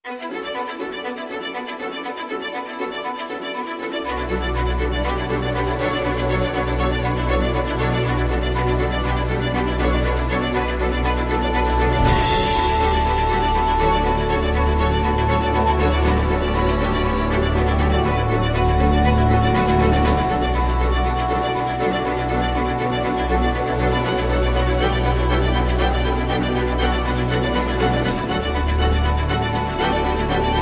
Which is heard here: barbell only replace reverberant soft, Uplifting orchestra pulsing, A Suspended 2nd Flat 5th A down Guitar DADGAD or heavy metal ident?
Uplifting orchestra pulsing